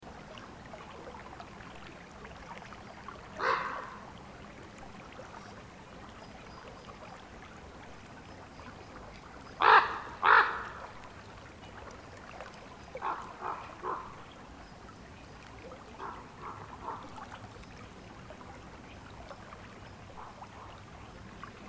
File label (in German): mulde atmos